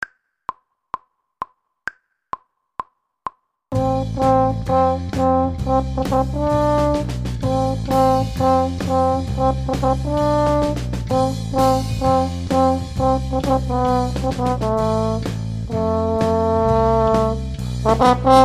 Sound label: horn section